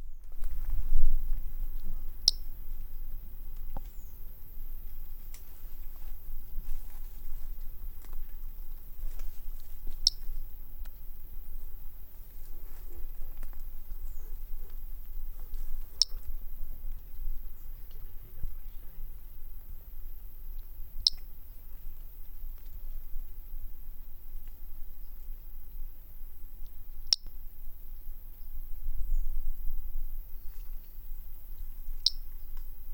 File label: Brachycephalus tridactylus